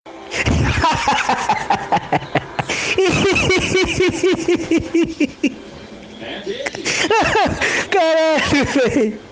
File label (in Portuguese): Risada